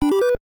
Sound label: Family Computer Disk System Loading Jingle; FDS